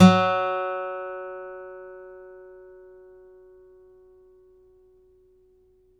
STEEL F F#1, Synclavier, GUITARS; Synclavier Sampler Library

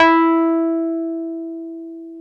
Wurly, Keyboards of The 60's and 70's

